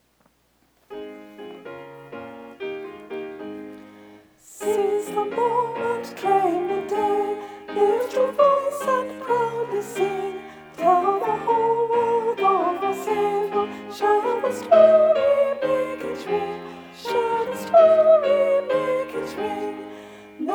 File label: with vocals